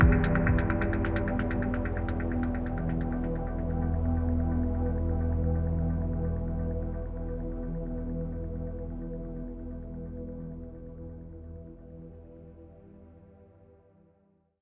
recreation; Mirrors Edge

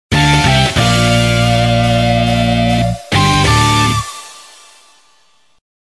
stage clear; X8

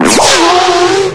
pop; Mickey USA 64

